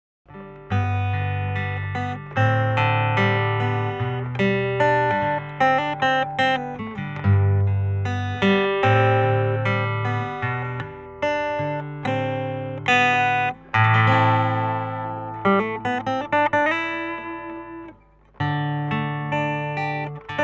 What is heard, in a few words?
k yairi yf 200